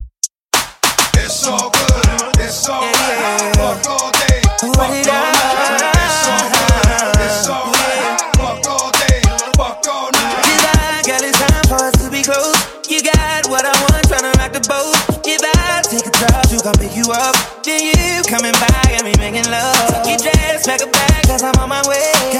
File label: RB Redrum Intro 100